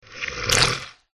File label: tsar scorpion attack voice middle